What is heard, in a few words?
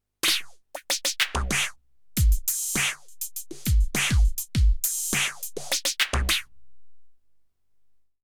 Roland TD07 Effects